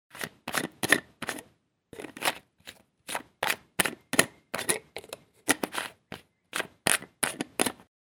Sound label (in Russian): zvuk kryshki